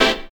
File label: chord, stabs